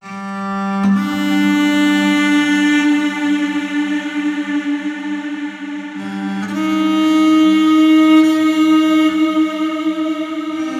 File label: Loop